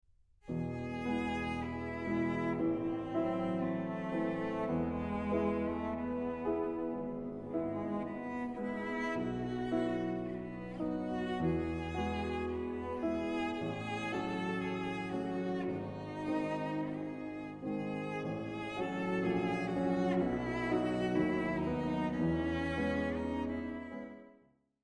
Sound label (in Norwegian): piano kvartett; mollsubdominant i dursatser